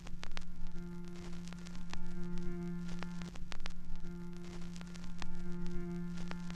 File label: Looping Vinyl Noise